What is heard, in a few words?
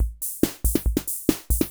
140 POPS; Best Service; XX Large Most Wanted; Sample CDs